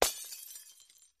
Light Bulb Breaks; Distant Glass Bulb Smash And Shatter. - Glass Smash